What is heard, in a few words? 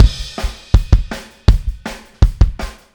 Kick, Drums, 162 BPM; Drums from the Big Room The Mixes; Take Your Toms Off; Chorus 06